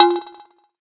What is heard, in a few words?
electric, keytone